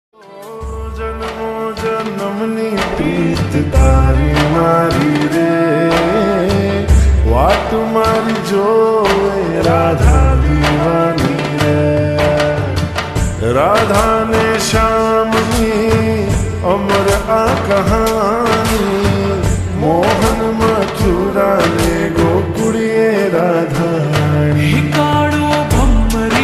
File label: Slowed Reverb Ringtone